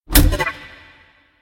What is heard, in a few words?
menu back; Stargvze v2